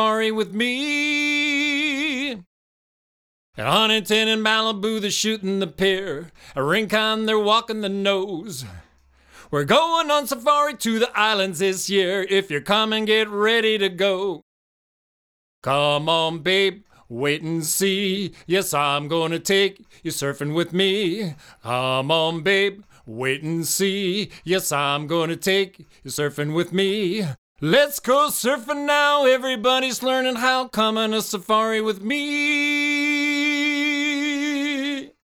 Vocal

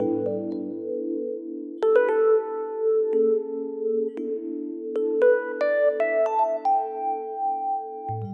Fusion Electric Piano

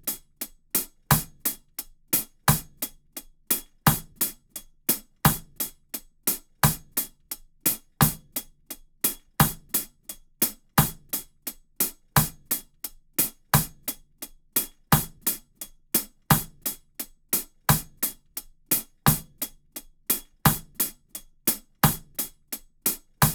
Drums Hi Hat

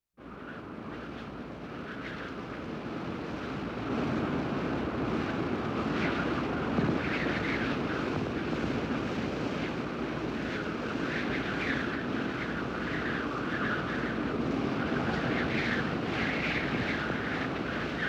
windy, ambience

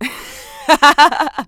Woman Laugh; laughs-chuckles

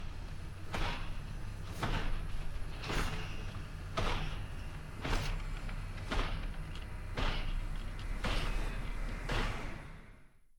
A Walking Machine; 384 Sound Designs

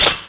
clickish; tools Bangs

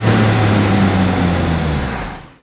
truck Stop; vehicles

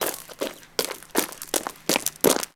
Footfalls Walkaway Quickly; footsteps

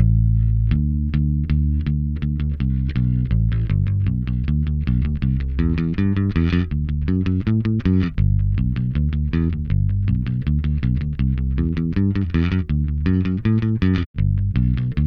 Bass

